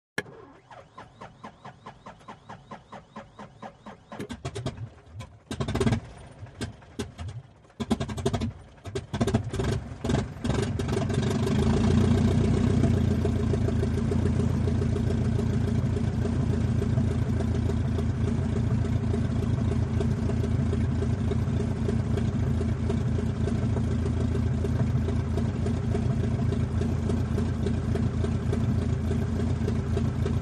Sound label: Start, Engine Almost Dies, Revs To Idle With Quiet, High-pitched Metallic Ringing. Rev Higher And Taxi, Isolated Burst Of Pops, Throttle Down, Tiger Moth